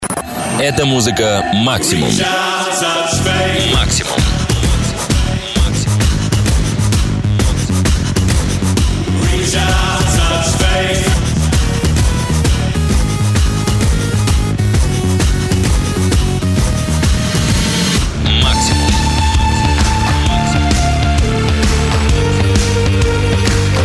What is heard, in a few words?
Jingle Radio, Beatmix; Radio Maksimum